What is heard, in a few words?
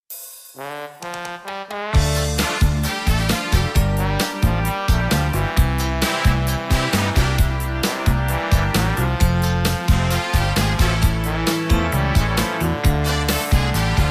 saxophone